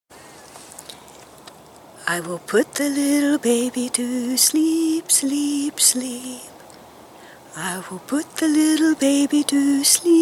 baby to sleep

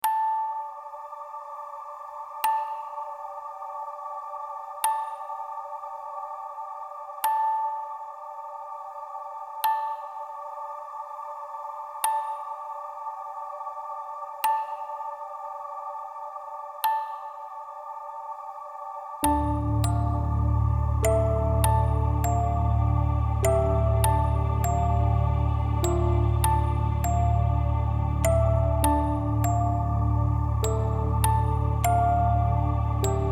horror music box

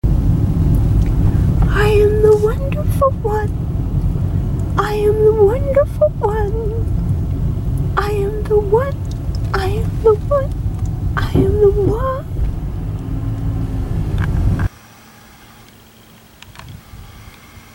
soundtrack

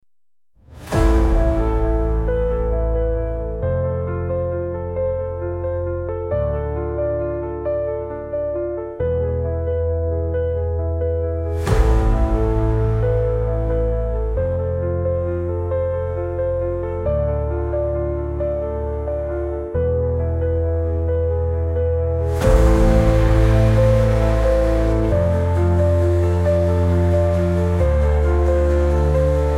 ascension

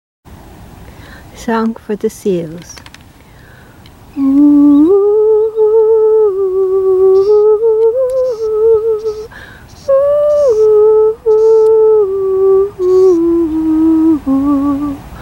Song for the Seals

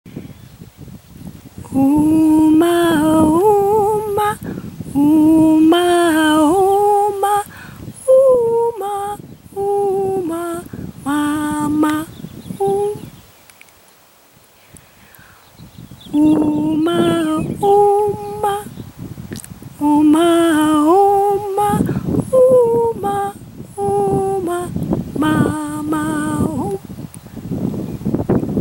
om ma for pine trees

